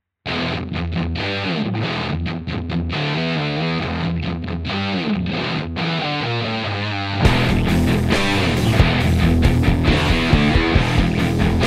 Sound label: fuzz